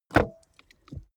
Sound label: car bmw e46 exterior door rear open; Cars